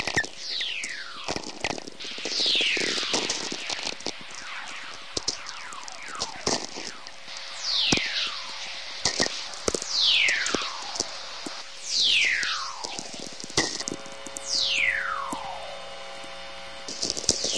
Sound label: Whistlers; physics radio